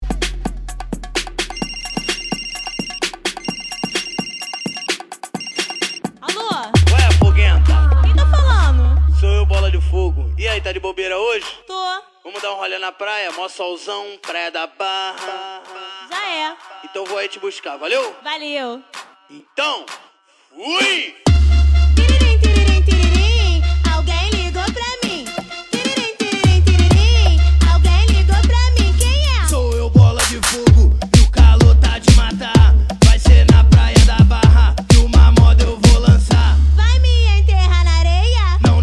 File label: retro